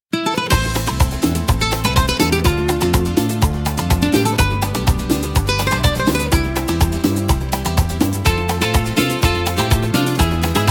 Light Latin, ringtones; Infinix XOS 16

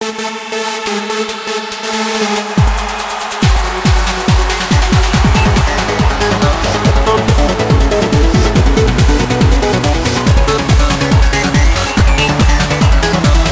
Ringtone Stadium; Acer Liquid E1

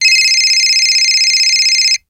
Cell Phone ring; Bluboo; S8

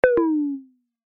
Low Battery; Balmuda

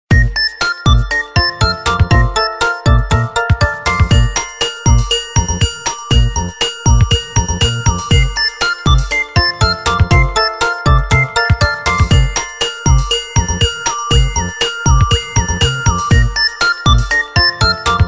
Soft Lead; Hisense; A5; ringtones

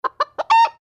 Message, notifications; Qiku; Luna Youth

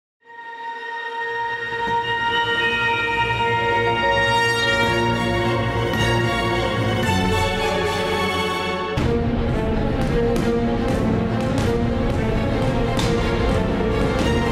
Bridge, ringtones; Oppo Find X903

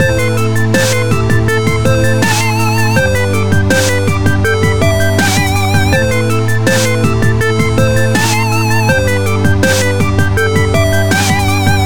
Whisperer, ringtones; CyanogenMod exclusive ringtones; CM10

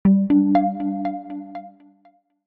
Echo, notifications; Itel; itelOS-13

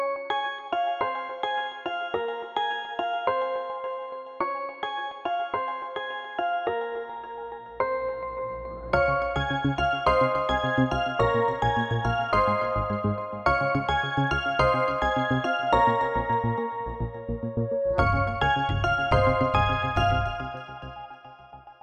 Synthesizer, ringtones; Honor; X7C

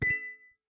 Notif, notifications; Yota Yotaphone 2

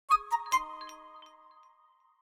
Surprised; Vivo X27 Pro

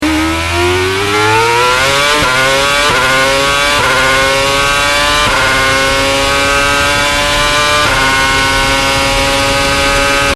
Acceleration, phonetones; Red Bull MOBILE

